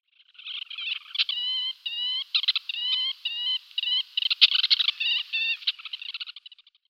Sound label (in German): Odinshuehnchen Phalaropus lobatus